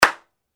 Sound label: hand clap